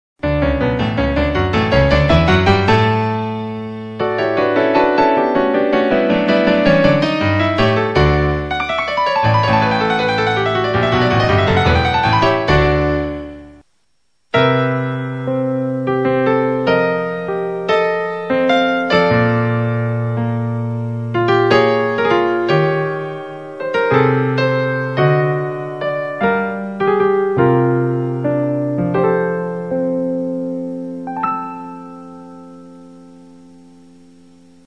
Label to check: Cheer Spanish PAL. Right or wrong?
Wrong